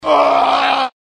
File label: UUGGGGHHHHWAAAHHH